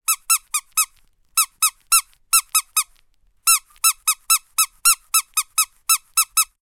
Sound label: toy squeaky squeaks multiple